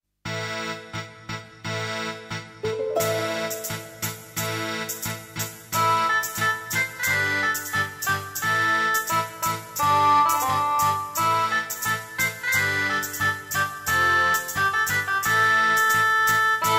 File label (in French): ambiance medievale